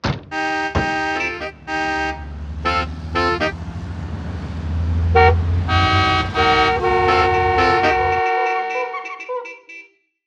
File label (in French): klaxon